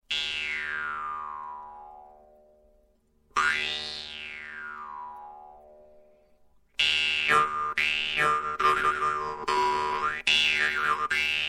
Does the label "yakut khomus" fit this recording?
Yes